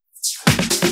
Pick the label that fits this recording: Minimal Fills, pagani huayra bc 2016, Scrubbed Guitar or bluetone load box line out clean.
Minimal Fills